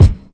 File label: classic hip hop kick; Classic Hip Hop Mega Kit